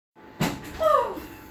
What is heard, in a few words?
Door Scare